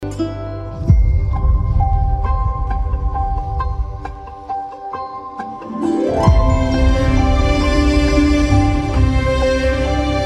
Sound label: Karaoke Song; chhaava